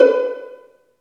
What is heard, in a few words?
Vlns Pizz; Roland L-CD702